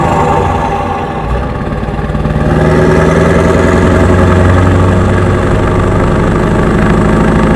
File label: third, vehicles truck; tdmcars